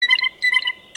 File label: blue jay call; animals